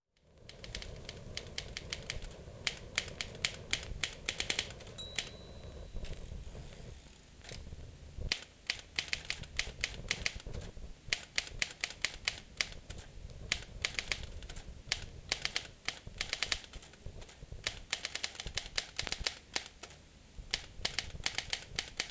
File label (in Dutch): typmachine mech met bels